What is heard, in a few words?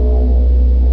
power hum